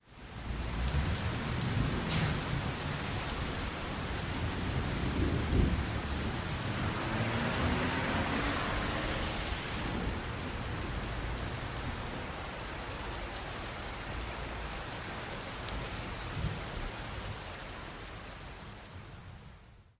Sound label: rain on empty street, rain